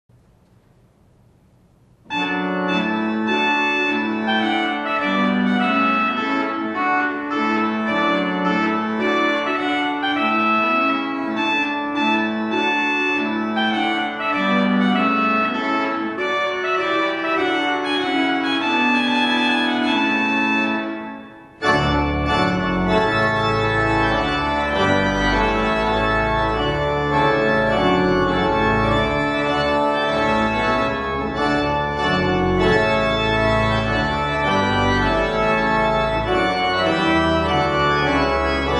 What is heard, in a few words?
trumpet tune in D; Purcell; wedding